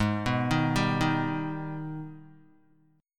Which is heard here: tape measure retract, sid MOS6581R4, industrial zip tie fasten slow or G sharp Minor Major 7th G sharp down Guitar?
G sharp Minor Major 7th G sharp down Guitar